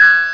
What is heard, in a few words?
Little Horn; Twenty-five Beeps #2